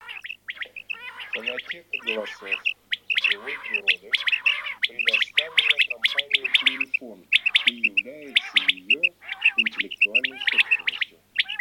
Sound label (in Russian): Перепел Quaglia